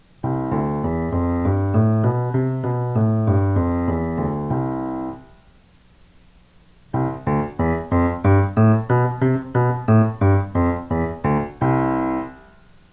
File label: c2 scale, real piano